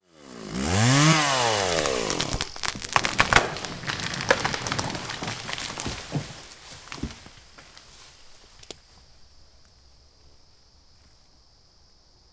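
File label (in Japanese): mori no naka ki ga taoreru, tree falling in the forest; Chino Cultural Complex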